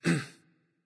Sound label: grunt attack; smite; voicepack; rama